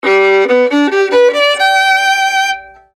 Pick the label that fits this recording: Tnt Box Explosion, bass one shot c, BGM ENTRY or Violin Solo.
Violin Solo